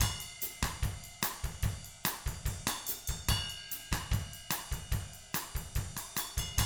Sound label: BOSSA, Drums